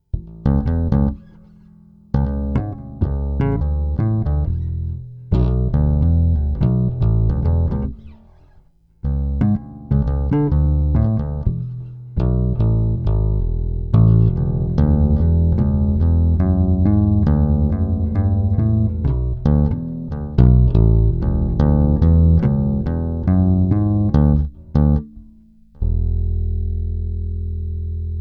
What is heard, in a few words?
tap-tap, bass; Rotosound